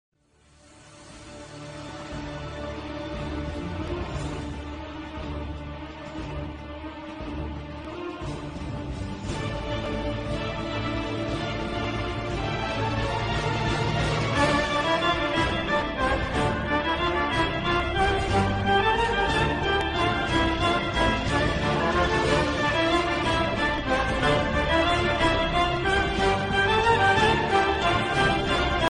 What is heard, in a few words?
electric laser violin ringtone